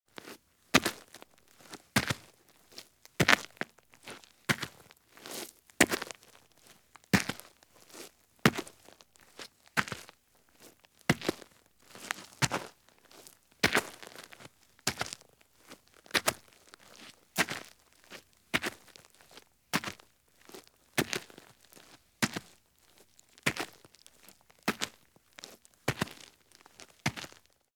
Heavy Boots Walk at Slow Pace on Roadside or Driveway Gravel; Sound Ideas; Footstep Sound Effects